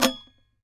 SAZ TAP; Guitars; Spectrasonics; Hans Zimmer Guitars VOL-2

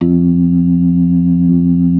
B3 F 2; Best Service; Hyper Dance

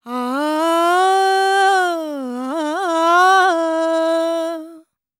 World Voices, INDIA; ILIO; Vocal Planet

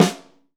LUDWIG, DRUMS; Best Service; Alpine Volksmusik

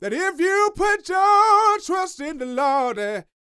Vocal Gospel; Vocal Planet Vol1 Gospel

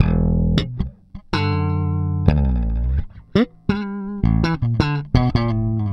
Slap, Bass; Bass X

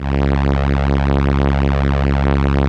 STR ELKA Cellos, Elka Strings; Roland LCDP09 Keys of the 60s and 70s 1

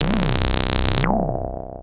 Anasound Long C, Industrial Analog Single Notes; Sonic Foundry (Sony Creative Software)

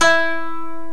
DULCIMR KOTO; OMI - Universe of Sounds

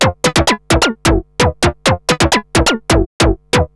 Analoop, Industrial Analog; Analoops; Sonic Foundry (Sony Creative Software)

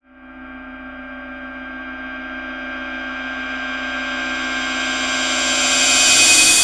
Reverse Hit, impact drum hits; Sonic Foundry (Sony Creative Software); The Electronic Music Manuscript Collection

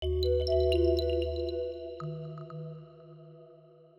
Synth Block Loop (120BPM); cinematic drama; Loops; musicradar

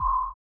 SONAR; Spectrasonics; Metamorphosis

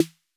808 SD; 90 sSampleCDs; Crypton - DrumBase5000; DR55+110+220

